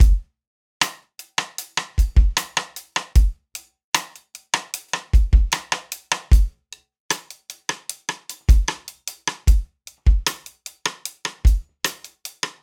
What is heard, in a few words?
76 Acoustic Drums, Live Trip Hop Drums; Loops; Smoke Signal